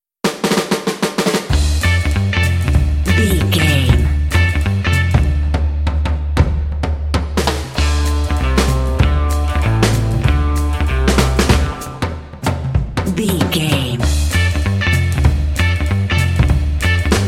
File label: retro groovy hipster fun ad jingle upbeat